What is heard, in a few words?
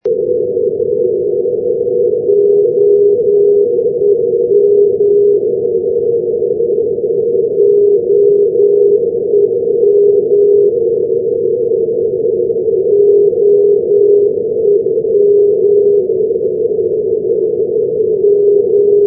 ndb 363.0; kristianstad-everod; europe